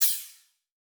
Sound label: Multimedia Internet CD-Rom Flash Pop Up Short